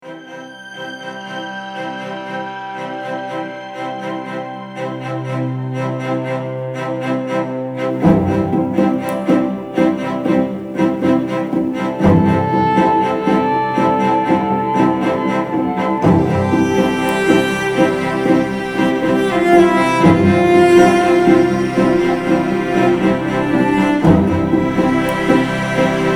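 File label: cello exploration; splice originals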